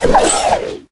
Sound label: ulti; Brawl Stars